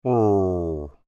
Creature Speak Groan Short, Human; Groaning; Big Room Sound

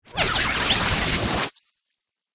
Power Up Digital, Scifi, Warble, Airy; Science, Fiction; Spacecraft; BlastWave

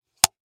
Film Slate Click Clapper Clap Board; Clicks; Objects; Blastwave; Foley

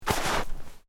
Footsteps Boots Snow Deep Step

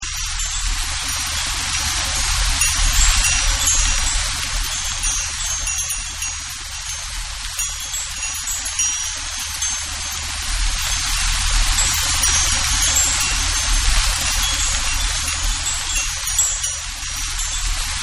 Leaves Chimes; Nature Scenes; Environmental; Partners In Rhyme

